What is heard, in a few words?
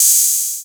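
808 hi-hat; Wizoo - Platinum 24 Electronic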